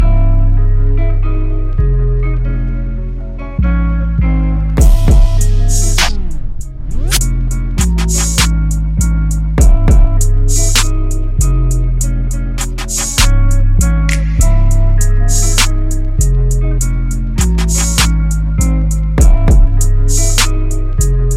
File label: Trippy Hip Hop